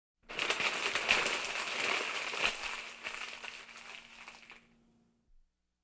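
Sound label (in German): Im Büro Papier zerknüllen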